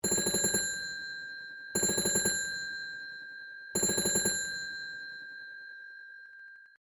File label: TELEPHONE WOODEN AUTOMATIC DIALING WALLPHONE RING LONG; Various old telephones